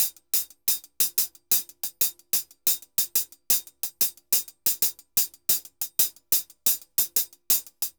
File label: HH, Festa Latina, Drums & Percussion; Best Service; Festa Latina VOL-1